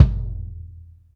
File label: BD, Bassdrums, Roland TD-20